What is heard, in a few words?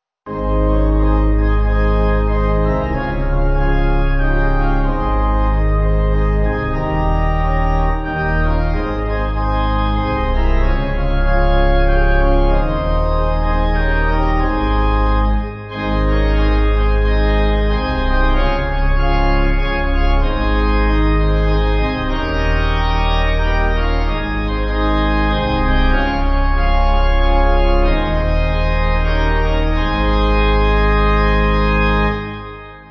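Pipe organ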